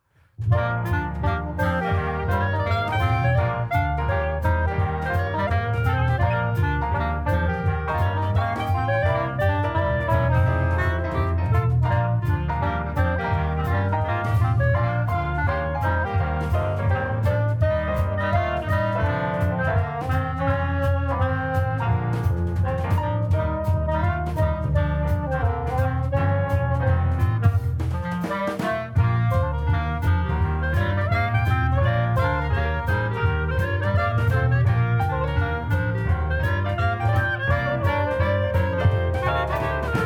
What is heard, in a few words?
jazz